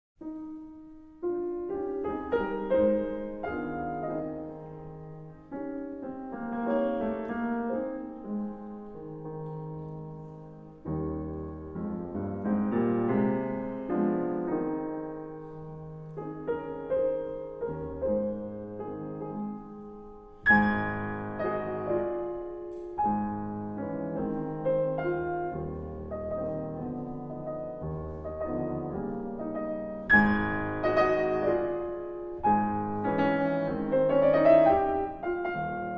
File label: Ballade No 3 in A Flat Major